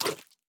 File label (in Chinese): 犰狳进食声